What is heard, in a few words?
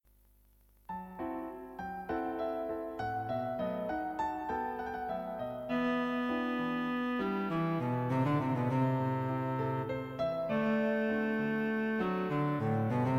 Cello; Cello and Piano; Although there are many running passages, they should not be too hurried.